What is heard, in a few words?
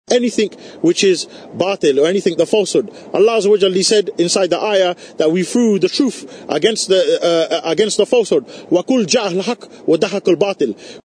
laugh